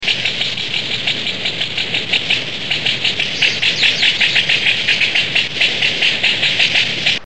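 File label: California Quail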